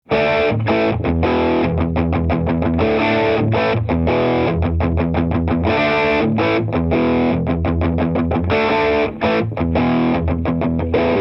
cash cow

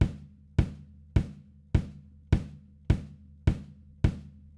kick before

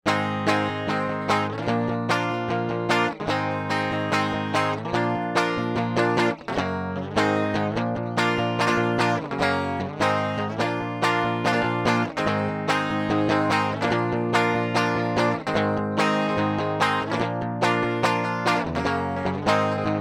strat stock DI

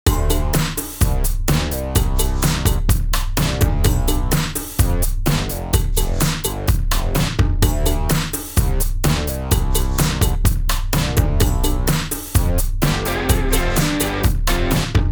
multiband before

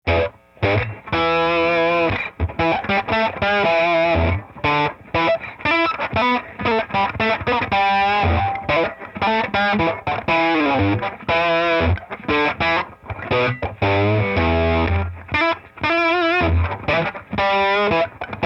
rat LP blues

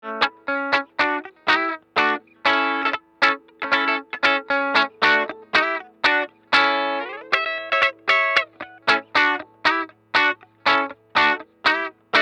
Tele bottom inst channel

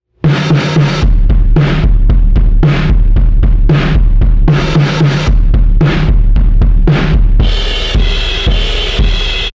Drums with More Devil Loc

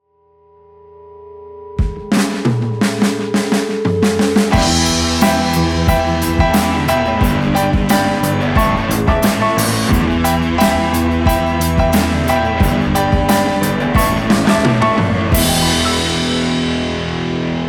Drums Pno Gtrs

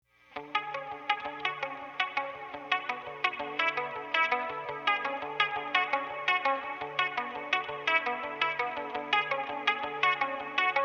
Demeter Reverbulator both tele 80s

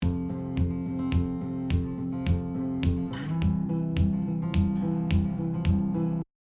two string noise lower

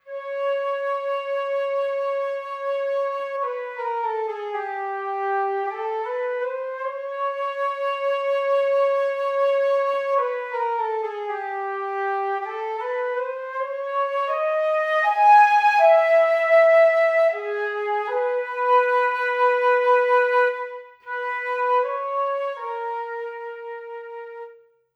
far mic; EastWest

